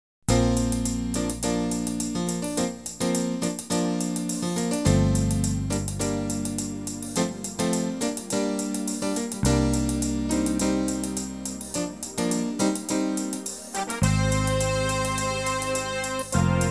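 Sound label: Bb Live track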